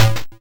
YM Synth Am; SYNTHS; CHIPSHOP DELUXE; CHIPSHOP HITS

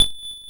Vibra A4; multi-samples; Vibraphone; CHIPSHOP DELUXE

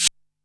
Open hat, techno drum

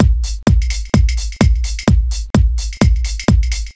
128BPM Drums No Clap, techno; drum fx; Beats

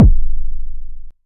Vermona Kick

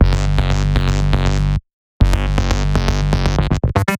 Tech Bass 120 G, techno; musicradar; 120bpm; Bassline

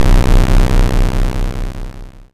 FX Base Jump; SFX; YM; ChipShop Deluxe; ChipShop Hits

